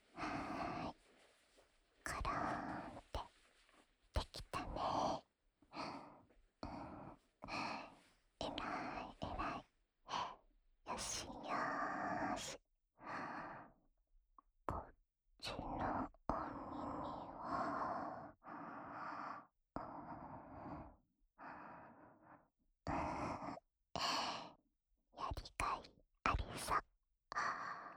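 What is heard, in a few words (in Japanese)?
耳かき（左耳）; お近づきのしるしに耳かきされる; えちえちシスターと免罪おまんこ性活。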